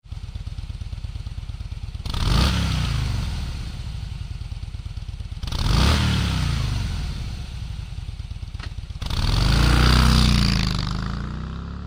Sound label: Motorcycle Cruiser